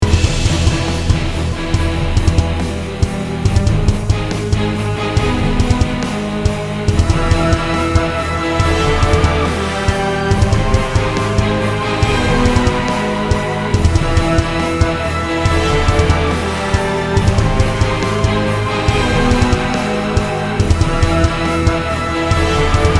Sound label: Action; Mothership; Duke Nukem Forever